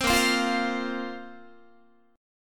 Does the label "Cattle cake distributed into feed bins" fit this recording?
No